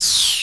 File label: Whish up to down med